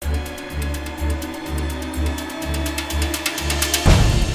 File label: Low Music; Alien Breed Trilogy; PS3; Xbox 360